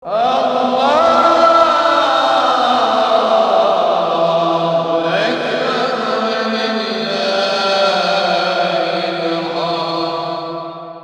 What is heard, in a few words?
PRAYER; Best Service; Ethnic Journey Sound Cube